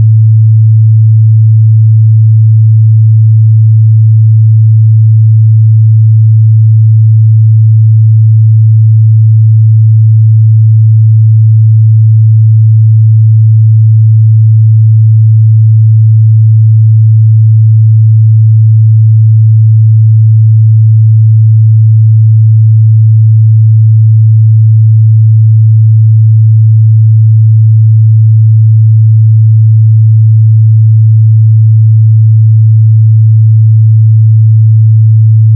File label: Guitar